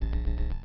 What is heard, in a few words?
Kart Brake; Pac-Man Kart Rally; java